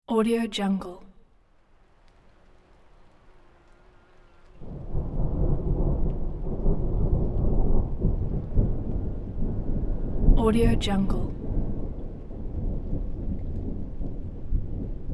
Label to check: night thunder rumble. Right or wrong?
Right